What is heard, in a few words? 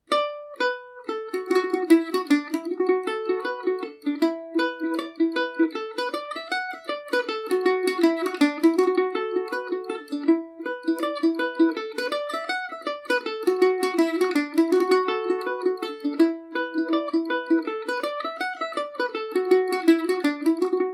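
D major, swing, irish mandolin; jackie colemans revisited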